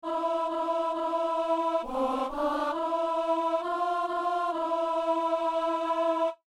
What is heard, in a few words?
alto; Psaume 26 (27); Messe du 17 janvier à Saint-Denis